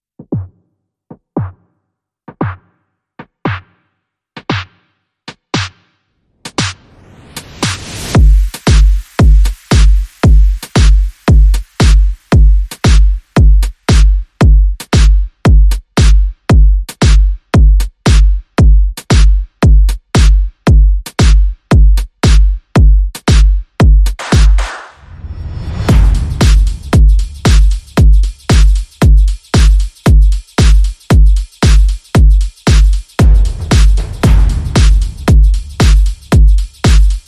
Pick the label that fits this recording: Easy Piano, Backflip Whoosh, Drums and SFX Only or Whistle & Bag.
Drums and SFX Only